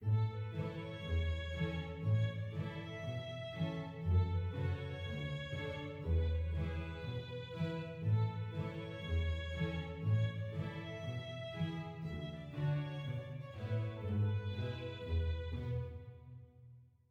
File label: happy soft